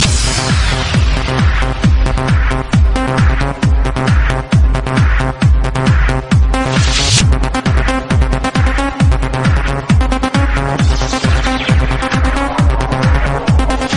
Electro

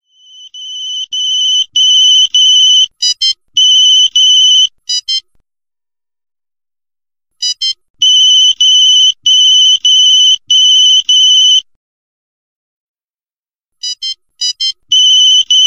clock alert loud

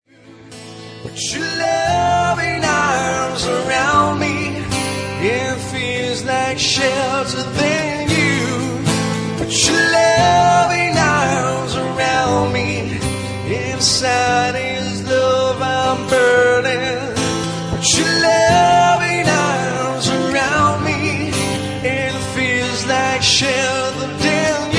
acoustic cover

